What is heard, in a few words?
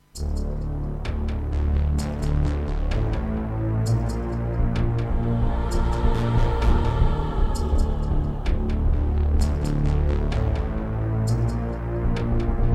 Korg X5DR; Descent II